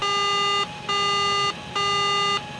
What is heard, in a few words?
alarm; Star Wars Knights Of The Old Republic I; XBOX